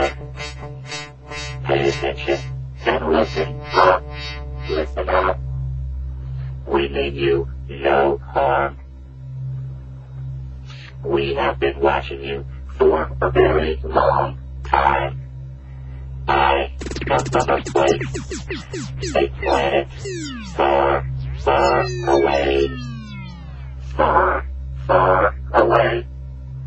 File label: Alien Message